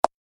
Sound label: keypress return